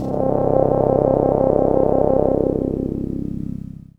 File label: EFFECT, Explosive Jungle; EFFECT 1; USB Soundscan vol.05; AKAI